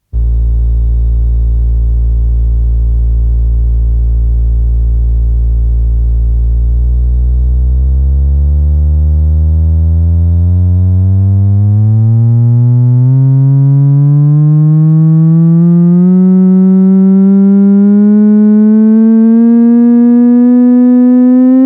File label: Sine Wave Tone, 60 Hz ascending to 1000 Hz; Tones; BBC